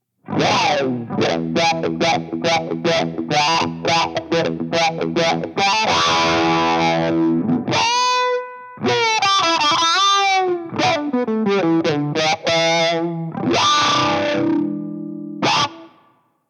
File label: Drive Attack